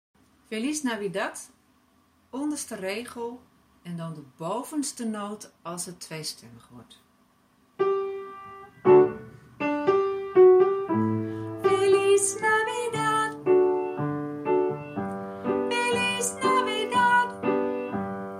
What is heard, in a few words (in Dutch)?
onderste regel bovenste noot ingezongen; kerst